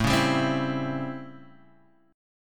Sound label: A 7th Sharp 9th; A7#9; strummed; JGuitar